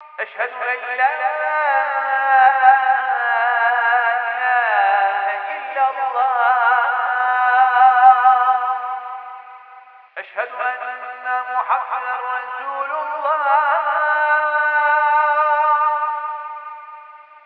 call To Prayer

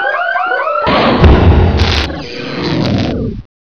gunner die forward

